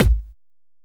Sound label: Kick, Drum Hits; Trance; Zero-G Trance Inducer; 90 s Sample CDs